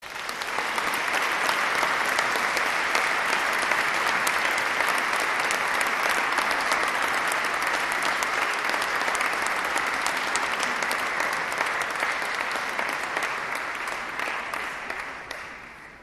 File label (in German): Applaus; fuer Chor und Jubilaeumschor; Konzertmitschnitt vom 20. Dezember 2016